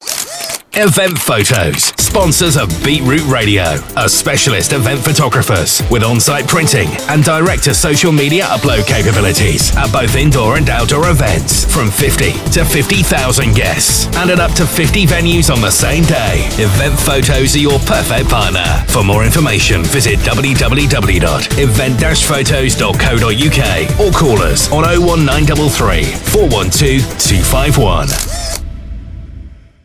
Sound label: Jingle